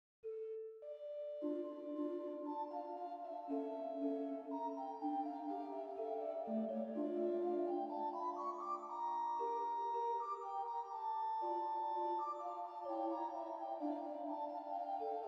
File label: Flutes; Lasceux